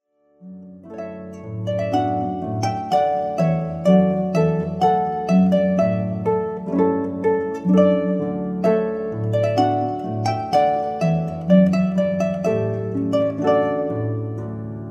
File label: Trumpet Tune